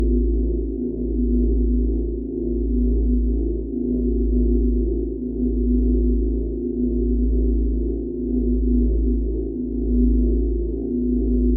AMB SCI-FI LOOP; Strike Team Gladius

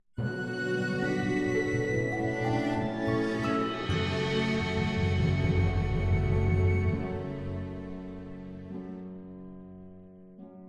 Music Stingers stinger Level Up

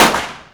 Weapon Pistol Shoot

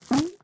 Gift Drop; Candy Crush Soda

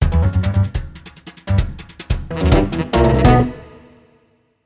Spin Wheel Stinger; cook serve delicious soundtrack

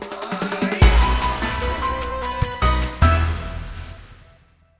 Level Win; SFX Game Level Win

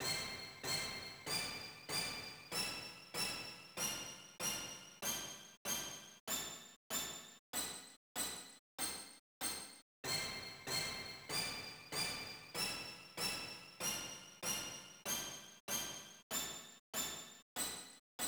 Sound Scale Check; fantastic journey; konami gx arcade

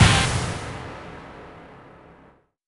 Explode End; gamerip; All Star Cheer Squad; nds

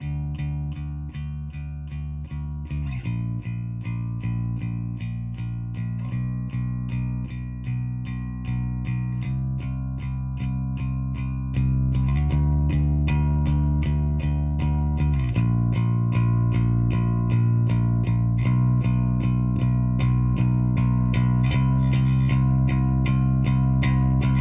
End Build BASS; transistor

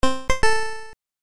1-Up; Wonder Boy Arcade

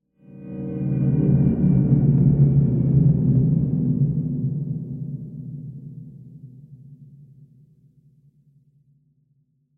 Scary Guitar; ML Scary Guitar Mood 8

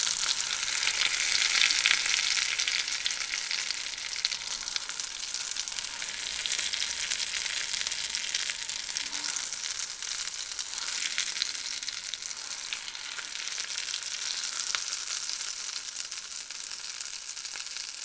Meat Cook; a dump in the dark

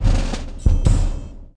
achievement

